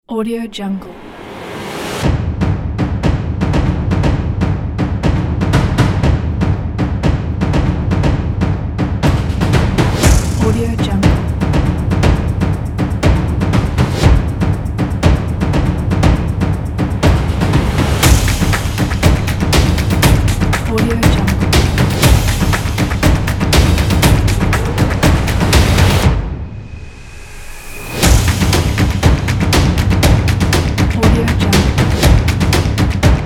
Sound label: epic drums